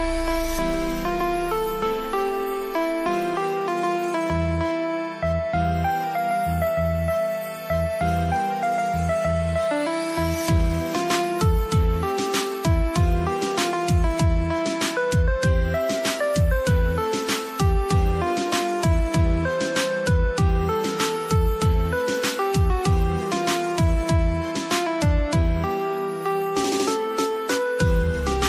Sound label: boys attitude ringtone, bollywood ringtones